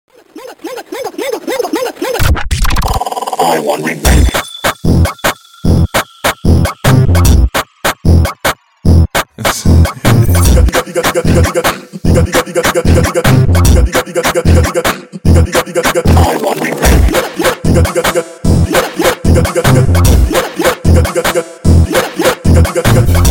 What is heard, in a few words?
Funk montagem sped Up